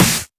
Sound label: Snares; Vengeance - Essential Clubsounds Vol.4